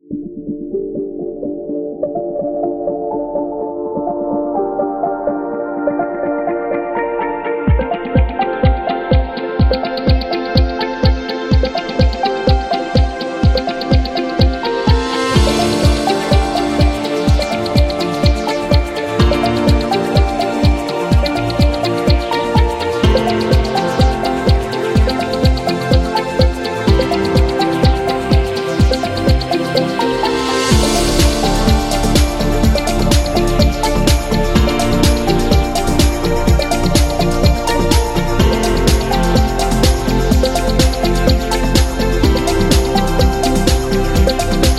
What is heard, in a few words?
Upbeat Commercial; business background